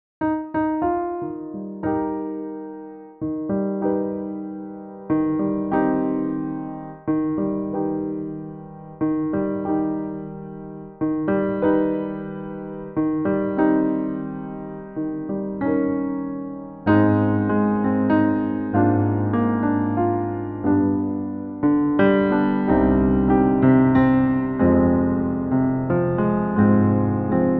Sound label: accompaniment only; happy-sad